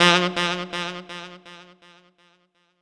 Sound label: Sax Echo